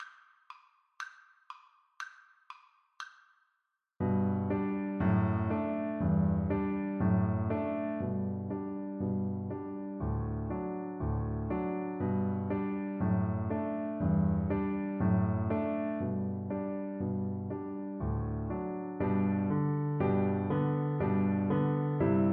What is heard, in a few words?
ecossaise, Cello; beethoven